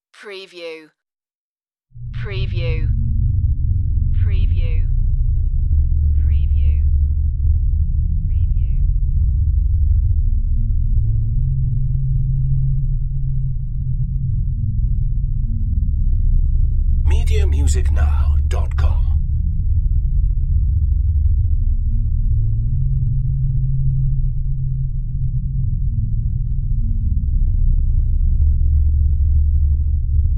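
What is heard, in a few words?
Deep Movement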